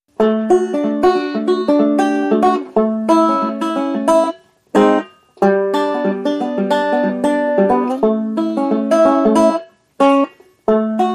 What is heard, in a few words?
Happy Joy Banjo Only